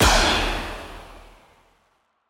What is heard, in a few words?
Gun Shot Reverb; SoundsCrate